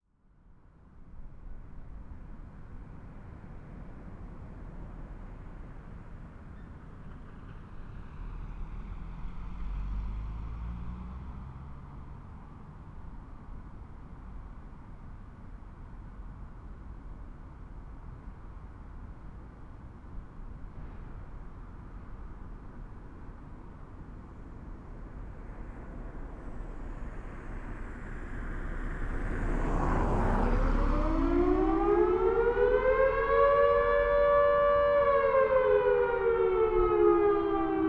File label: Siren; Langley